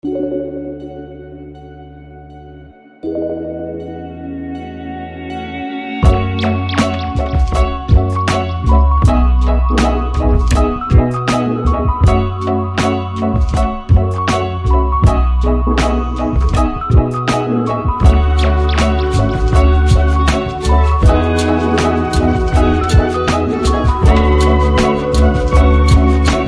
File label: Cosmic Ripples